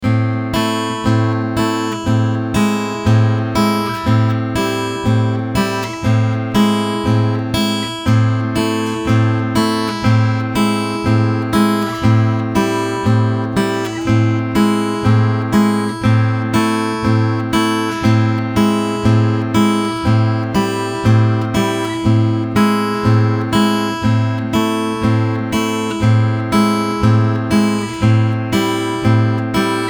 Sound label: Fingerstyle, acoustic guitar